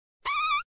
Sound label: tiny wet fart